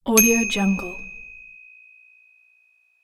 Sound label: bicycle bell